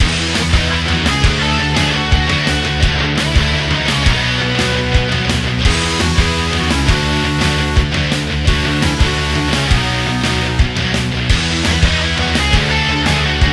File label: 3d Music